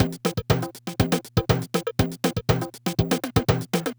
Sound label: Loop, dd-5